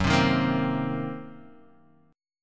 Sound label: F sharp 6th Flat 5th F sharp down Guitar Drop D